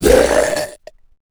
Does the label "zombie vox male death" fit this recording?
Yes